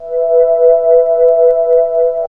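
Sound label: ambient tone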